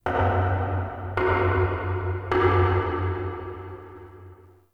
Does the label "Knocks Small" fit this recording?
Yes